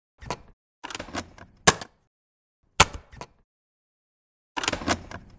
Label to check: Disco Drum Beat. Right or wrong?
Wrong